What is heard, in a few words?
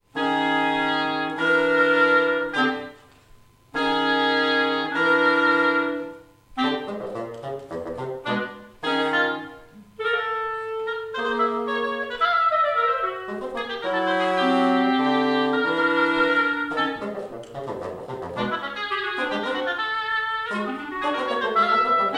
from the music box